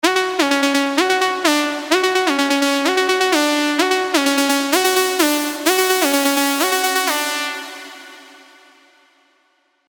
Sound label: lead, trance melodic leads; Fanfare; Revealed Serum 2 Trance Melodic Leads Vol. 1